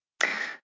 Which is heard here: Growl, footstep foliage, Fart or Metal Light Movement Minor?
Fart